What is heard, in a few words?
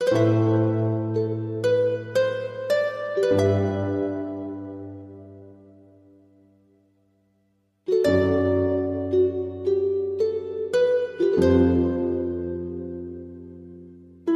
Harp Only